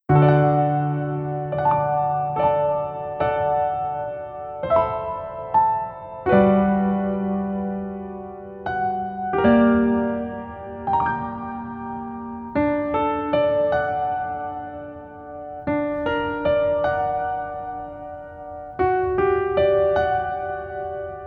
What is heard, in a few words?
Piano ver